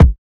reverb bass drum sound c sharp key

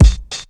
old school rap bass drum single hit g sharp key

